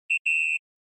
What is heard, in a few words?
SNES Soccer Whistle